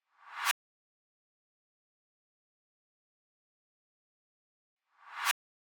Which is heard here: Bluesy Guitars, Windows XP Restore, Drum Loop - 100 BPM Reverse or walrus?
Drum Loop - 100 BPM Reverse